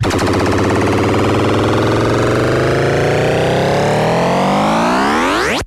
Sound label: charging up; Zaps and Beeps